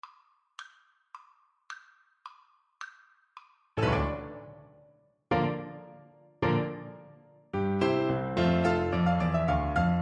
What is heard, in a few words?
Fossiles FL kar, Flute